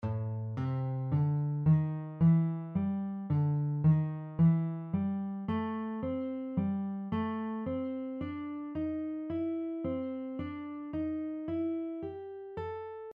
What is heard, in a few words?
6 note ascending blues scale pattern, guitar; 6 note ascending blues scale pattern 1 example with guitar tabs